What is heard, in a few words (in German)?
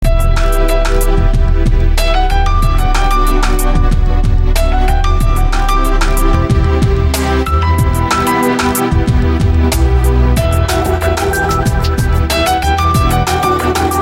coole wecker töne